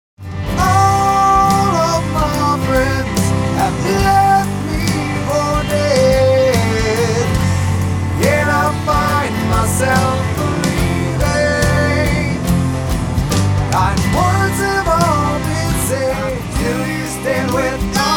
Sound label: medley